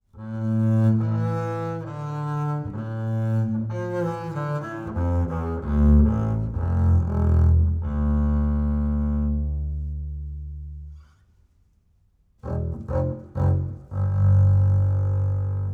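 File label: acoustic bass Bowed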